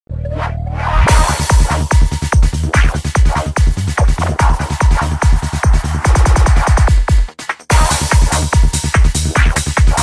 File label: beat